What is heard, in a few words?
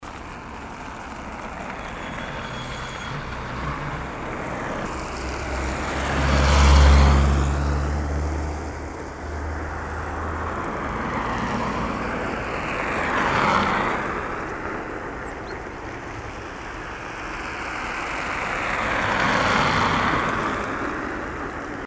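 sound of road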